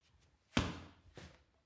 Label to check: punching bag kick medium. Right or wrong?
Right